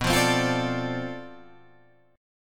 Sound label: B Minor 7th Flat 9th Flat 5th B, guitar chord